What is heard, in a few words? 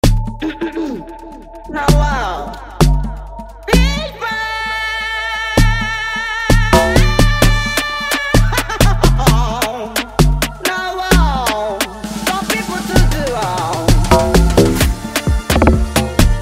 EPiano